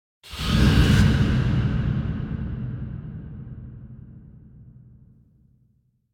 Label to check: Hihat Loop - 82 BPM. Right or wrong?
Wrong